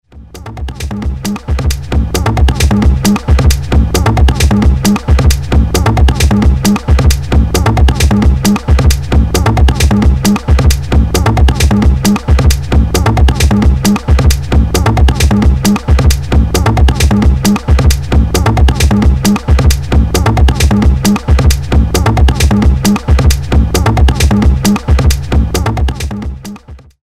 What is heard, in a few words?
Loop